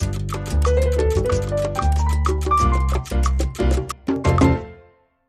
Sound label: Montuno Madness Sting